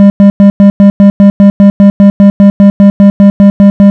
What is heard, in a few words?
am out, AM Modulation